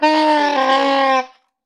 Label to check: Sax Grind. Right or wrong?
Right